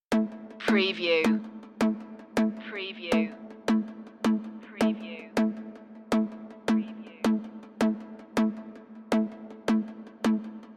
digital ocean no lead synth

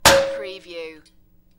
Metal Short Impact Ringing

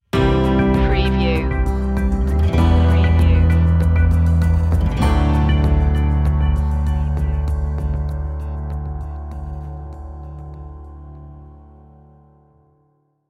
dreamful stinger

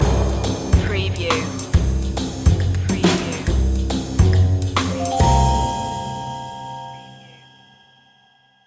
house of the creeping sounds stinger

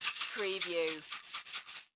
Seasoning Bottle Shake; sound effects bible

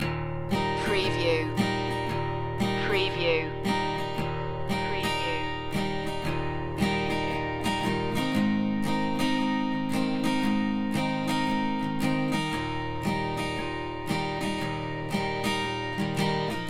loop

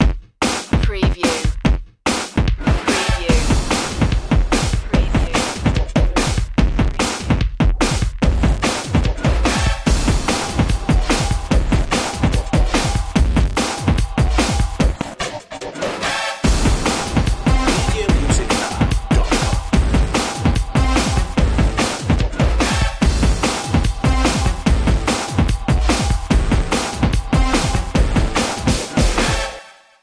goliath funk

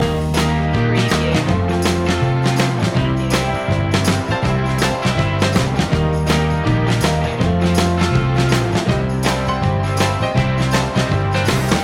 vintage blues loop

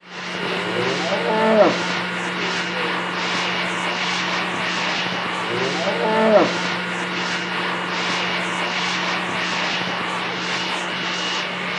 Cow Vaccuming At Auction

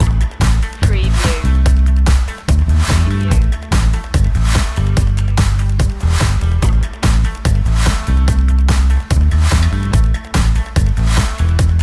positive force loop

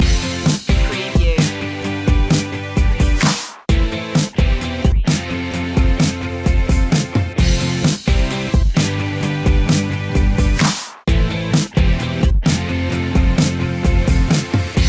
kids raised by television loop

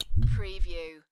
Strange Click; beanstalk audio

